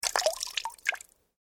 Water Trickle Short, Various water sounds recorded in a domestic pool; Nature; Foundation4